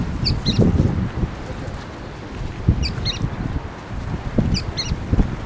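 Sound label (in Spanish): pijui f gris, Synallaxis frontalis - Pijuí frente gris - Sooty-fronted S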